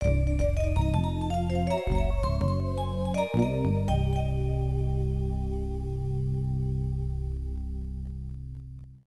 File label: Logo; Street Fighter Alpha; Zero; CP System II; arcade